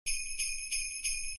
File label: jingle bells